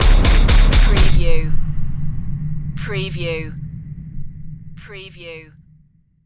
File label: man machine stinger